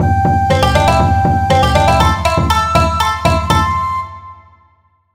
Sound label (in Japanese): 和ジングル